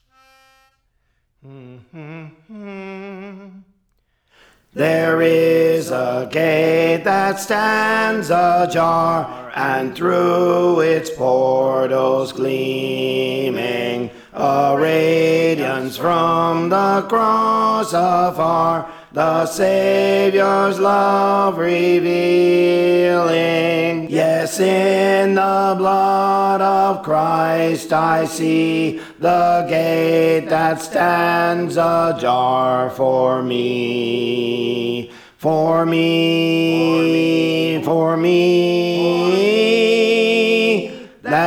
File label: Alto; Praise For The Lord Training